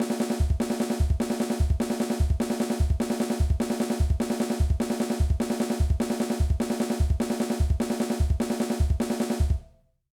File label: Hand and Foot Exercise, drum